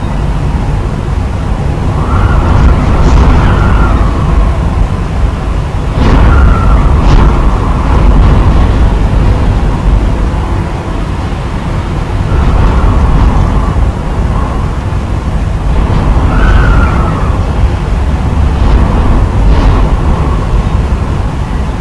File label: high wind; sc cyclone